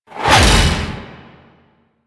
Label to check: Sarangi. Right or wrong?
Wrong